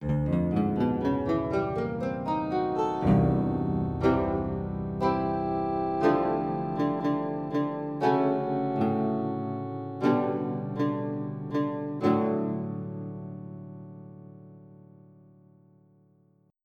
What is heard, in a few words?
Mandolin Wind